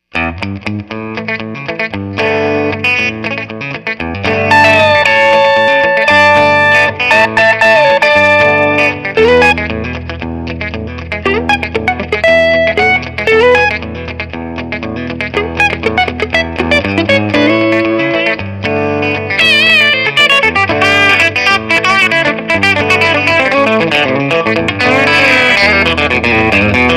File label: Tele Brite Down